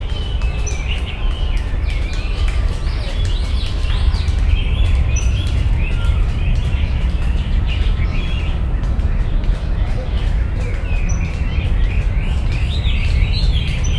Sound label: warbler pale legged leaf Phylloscopus tenellipes